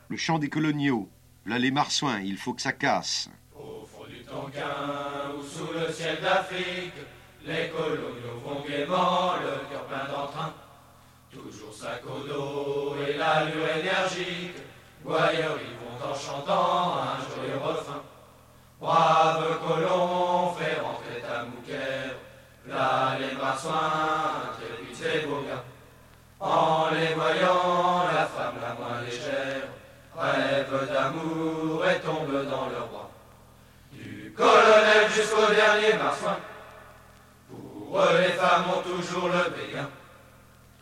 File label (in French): Le chant des coloniaux; Troupes de marine